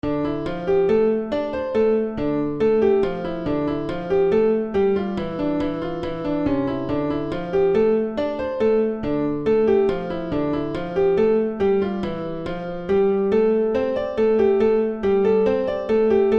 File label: Piano Test